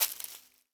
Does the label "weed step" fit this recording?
Yes